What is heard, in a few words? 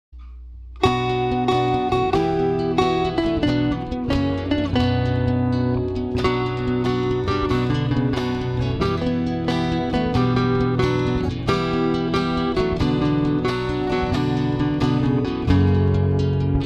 karaoke